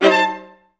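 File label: violas A3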